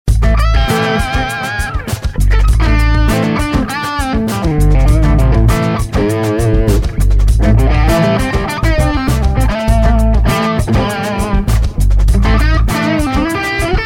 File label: Mercury EL34 Stratocaster